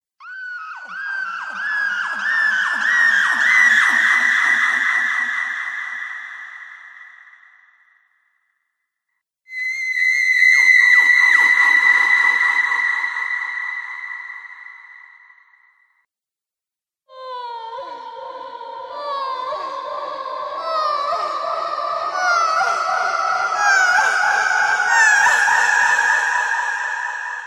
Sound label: Screaming Surreal Horror Collection; Screaming Sound Effects